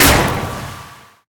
hit silence; smite; thanatos

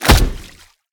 hit ice; smite; god merlin

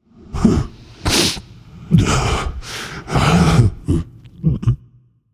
nv boohoo; smite; voicepack; ganesha

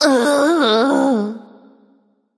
ugh; smite; voicepack; kukulkan

